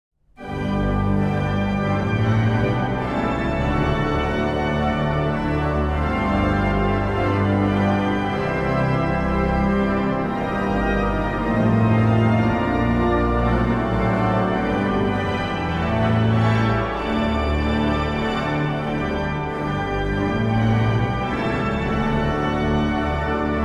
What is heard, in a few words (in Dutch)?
orgel; Laat ons de Heer lofzingen; Heykoop Pieter improviseert in Schiedam Rotterdam en Bolsward